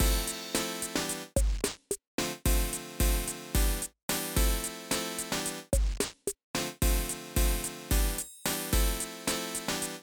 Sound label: Bgm Main